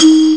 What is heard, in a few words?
flute synth; Amiga Soundtracker Sample Packs